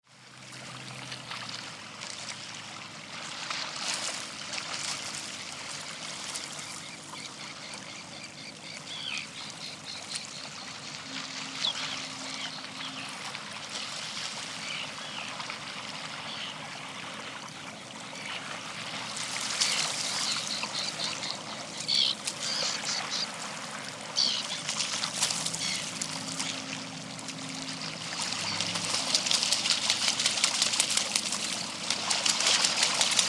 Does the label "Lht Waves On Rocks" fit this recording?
Yes